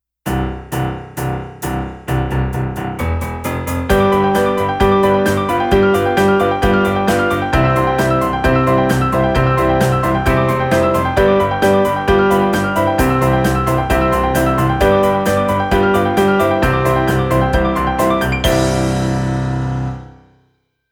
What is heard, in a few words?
Two Note March